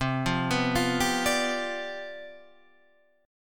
C Major 7th Suspended 2nd Suspended 4th, Standard, chord; arpeggiated; CM7sus2sus4; 8,8,9,10,8,10